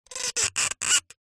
vibrations cello bow multi timbre cardboard tag short; sound spark